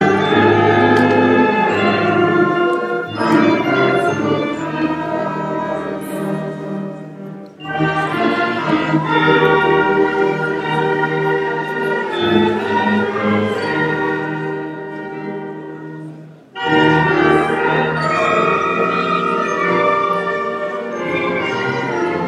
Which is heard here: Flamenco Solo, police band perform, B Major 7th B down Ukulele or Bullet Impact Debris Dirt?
police band perform